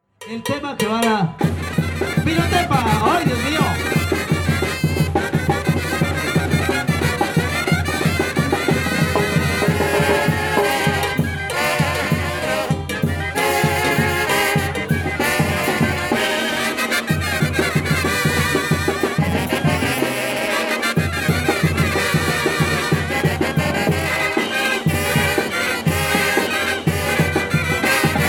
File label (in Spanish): Fanfare y feria Oaxaca